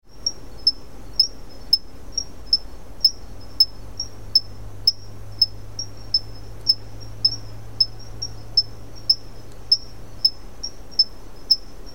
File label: Adenomera marmorata; Guarau Peruibe SP BR; Silveira